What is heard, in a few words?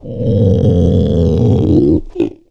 DIE